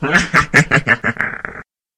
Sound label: monster demon